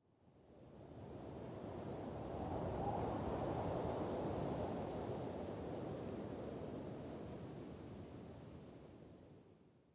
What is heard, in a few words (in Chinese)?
灵魂沙峡谷风声; ambient